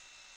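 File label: security camera; Soldier Of Fortune II Double Helix; XBOX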